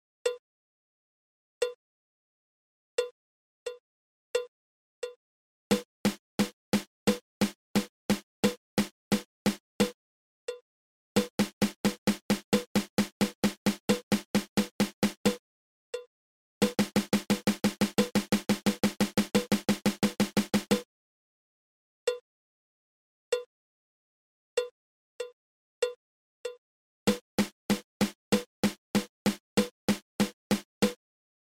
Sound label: Single Stroke Roll